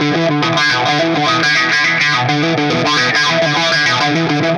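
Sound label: Delay Wah, Guitar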